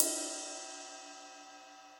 Ride Multirod Dry; Drums; Drum Hits; Session Drums Studio; MPE Hits

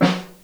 Room, Snare; Ableton Foundation FreePacks; Snare Designer

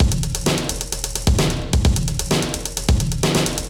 HipHop Beat 85bpm, MIDI Drum; Ableton; Factory Packs

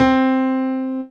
Grand Piano, Vector Attacks; Retro Synths; Components

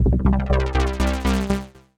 Honest Analog, Analog Bass; Ableton; Factory Packs; Retro Synths; Analog Bass Vol 2

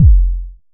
analog deep, Kickbank; mpk mini presets; Ableton Foundation FreePacks

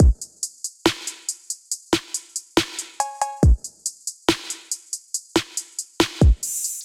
Diverge Kit 70 bpm, Trap Drums; Trap Drums by Sound Oracle; Ableton; Factory Packs

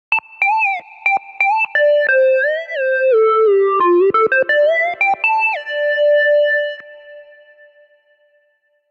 Sine D Minor 88 bpm; MIDI Clips; Tonal; Melodic; Ableton; Factory Packs; Chop and Swing

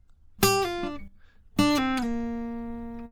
077 Pop Ac-Guitar Dm; Pop Rock

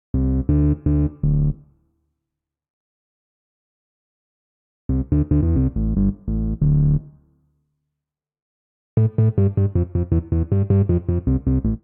D Minor 87bpm, Bassline; Pan Party EP

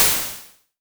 Noise Short Room; Ambience and Small Rooms; Ableton; Convolution Reverb

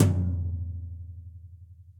Tom Multirod Floor Dry Acoustic; Drum Hits; Standard Hits; Ableton; Factory Packs; Session Drums Studio

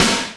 Crack Snare; attacks; Ableton; Factory Packs; Retro Synths; Vector; Components

